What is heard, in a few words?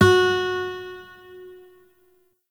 Shawzin Oct Two F Sharp; Warframe; Lotus Shawzin